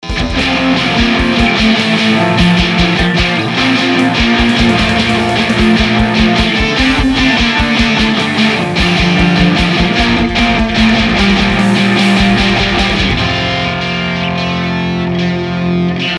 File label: dystortion wet part